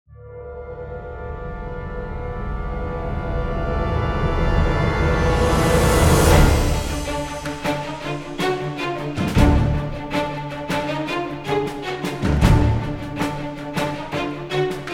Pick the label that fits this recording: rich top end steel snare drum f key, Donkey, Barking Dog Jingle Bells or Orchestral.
Orchestral